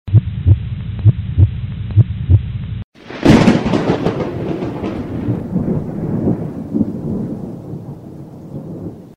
Heartbeat Remix

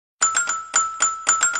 cute and short sms; ringtones

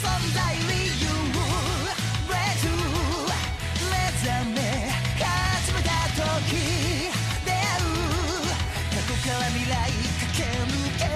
whistle; tokyo raven

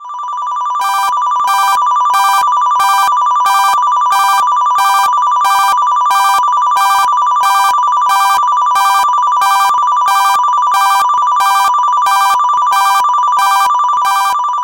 Clock Alarm

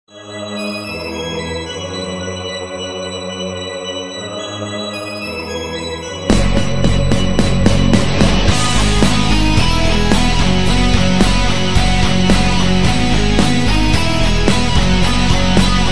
instrumental

